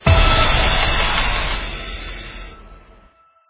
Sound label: BGM super win end; football; Brazil